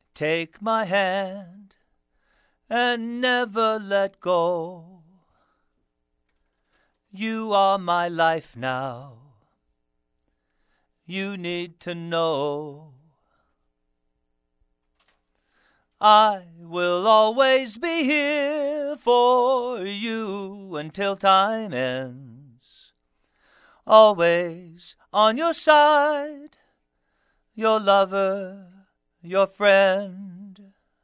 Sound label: Male Vocals